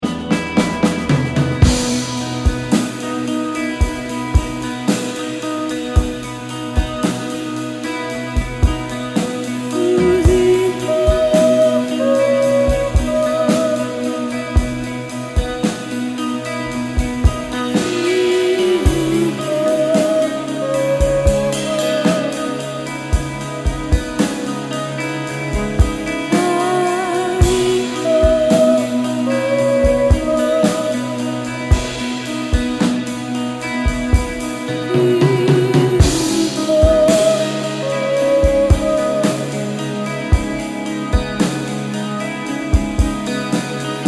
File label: last blues